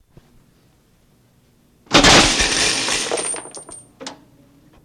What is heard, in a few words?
Slot Machine Coin Dro